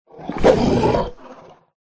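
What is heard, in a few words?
werewolf attack voice up; oboroten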